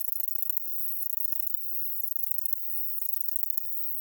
song sradocantans, katydids, neduba

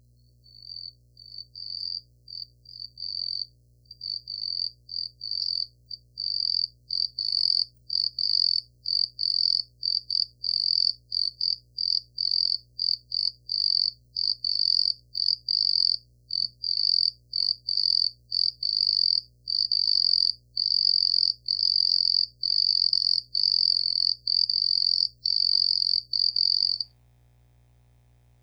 call

